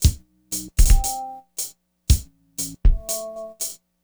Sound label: BEAT, Hip Hop